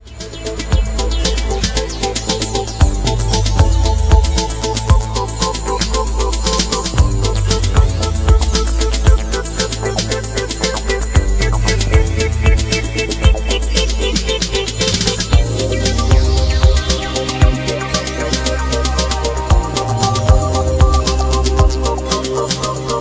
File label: spacenutrition, ambient